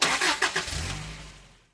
engine start; Quentin C's Gameroom

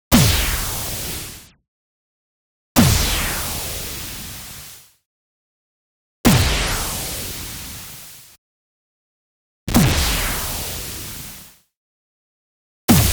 IMPACT WHITE NOISE Bass Thud; boom library; Anime Essentials

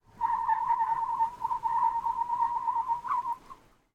skid asphalt; Blind Drive

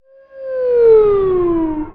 enemy approach; Space Wave Race

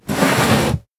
move desk success; blindside

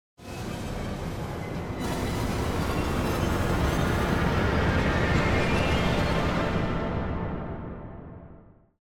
Crazy Trumpet - Rising Orchestral Cacophony; Hollywood Edge; sound designer's toolkit v2

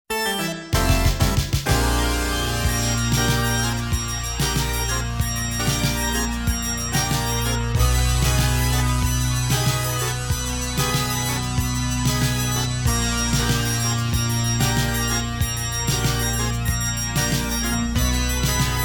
News Intro, beds, jingles and music

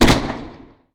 weapon

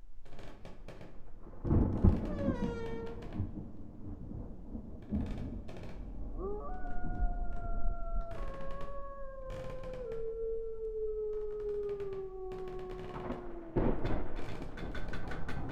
room spooky loop; Audio Game maker Sound Library; worldsounds

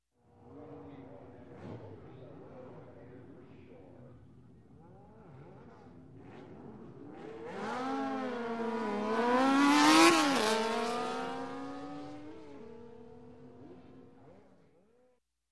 Motorcycle, Race, Drag 2 Bikes Rev Up & Race By; Sound Ideas; Series 2000

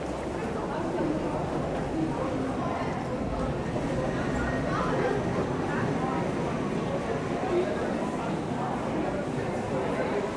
Urban people babble crowded; urban and suburban; boom library

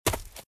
Footsteps - Leaves - Run - Left; RadwinIsland

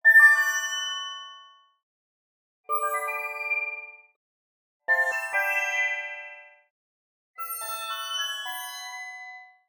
Arcade Click Positive

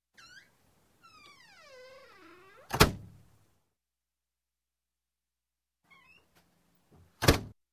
Door, Wood Squeak Close; Sound Ideas 2000 Series

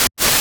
Static; Blastwave

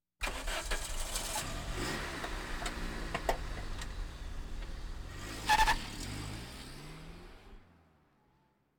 Automobiles various

